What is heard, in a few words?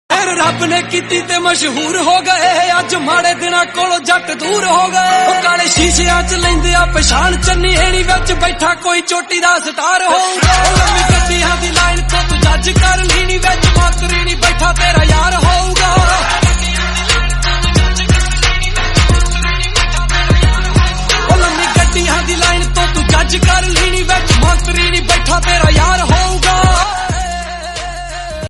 punjabi song